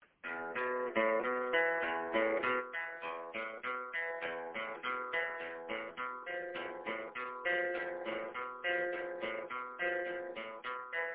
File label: desert scene